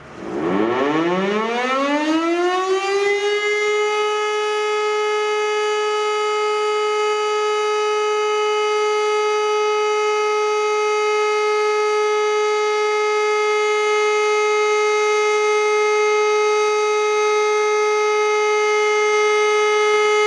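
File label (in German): Entwarnung, sirenentöne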